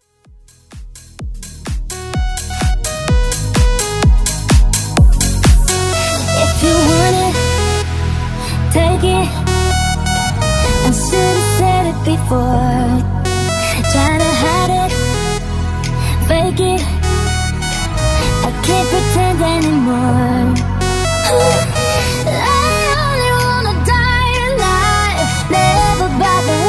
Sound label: MashUp